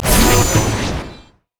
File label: orb return; LoL; ahri skin04